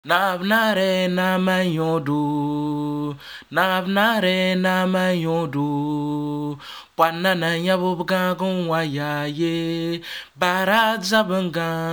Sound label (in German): Gesang 105 bpm A